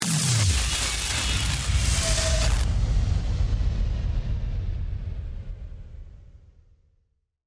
Transforming Robot, Transforming, Robot, Power, Down, Scifi, Transition; BlastWave